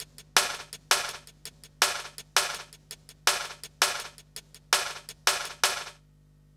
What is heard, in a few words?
165 BREAK; USB Soundscan vol.05; AKAI; 90s sample CD